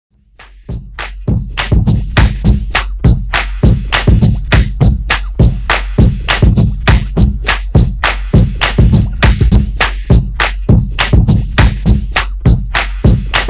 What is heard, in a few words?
Redrum 102Bpm